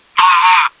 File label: pain; csco; csgo; bot